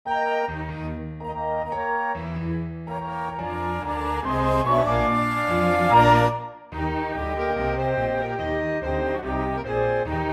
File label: five dances swingin blues; yoder paul